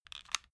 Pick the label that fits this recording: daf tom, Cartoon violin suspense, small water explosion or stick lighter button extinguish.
stick lighter button extinguish